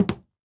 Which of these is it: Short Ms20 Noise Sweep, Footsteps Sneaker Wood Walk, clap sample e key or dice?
Footsteps Sneaker Wood Walk